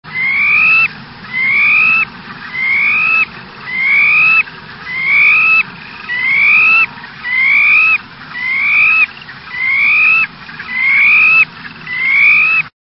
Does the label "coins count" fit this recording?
No